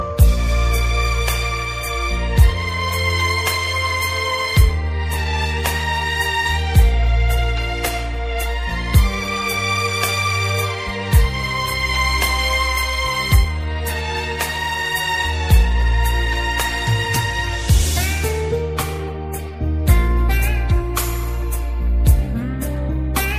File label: ringtone melody